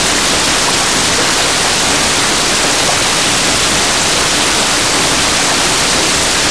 surfacerain hard loop, rainscapes

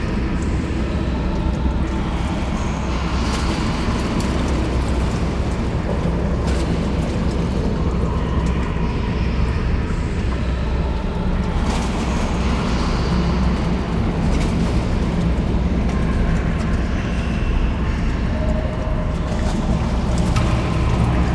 water filled basement amb loop, ambience

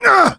hurt major, coach; survivor

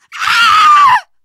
death scream, teen girl; player; survivor; voice

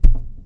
wood solid impact soft; physics

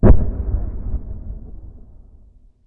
dist explosion, ambient

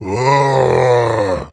male zombie growl; boomer

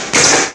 zap

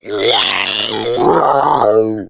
female boomer lurk; voice, idle

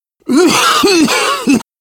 cough, survivor voice biker

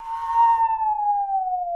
saw danger, music, zombat

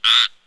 small frog, frog groups, random animals

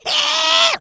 rage, infected, female; npc, action

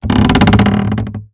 coaster creak

